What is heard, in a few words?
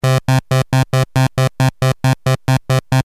phase; Fineを±0にしても打鍵毎に位相が揃わない事があるのね。; nord3